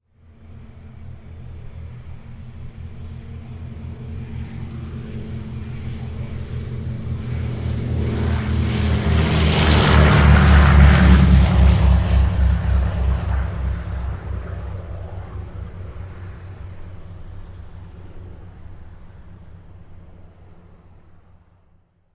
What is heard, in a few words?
Airplane Historic Old War Single Propeller Plane Takeoff